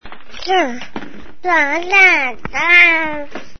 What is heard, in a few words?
BABY TALK